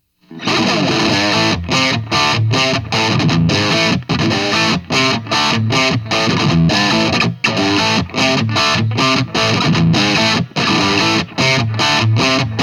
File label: LOW BOOST; st9pro+